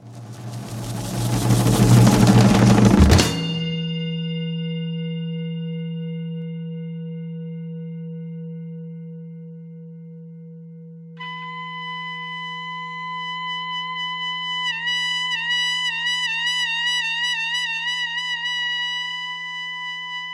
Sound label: Intercontinental Concerto for Trumpet